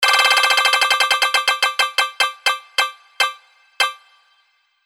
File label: Spin Wheel